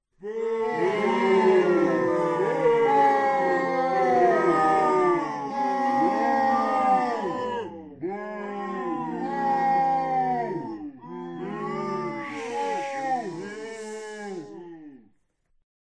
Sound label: boo